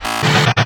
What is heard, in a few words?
throttled; DonkeysAndDroids.Godot